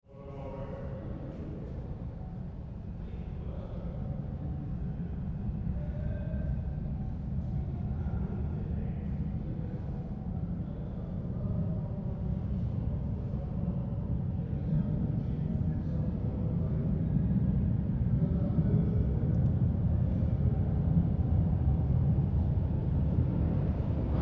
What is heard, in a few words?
Train Subway Underground Up stop and away; medium shot - doors open close